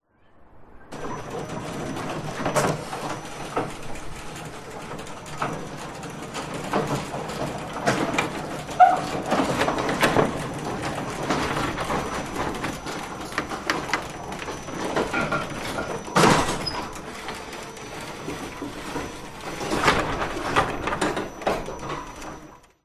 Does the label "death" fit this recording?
No